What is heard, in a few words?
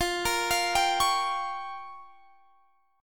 C sharp 6th Flat 5th F